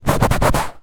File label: household couch wipe arm fabric clean cloth; zapsplat